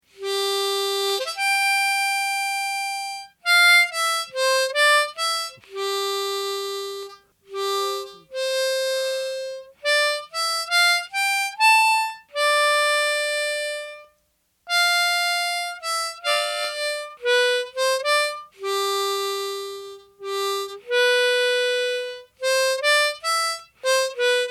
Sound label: harp, harmonica; MIDI backup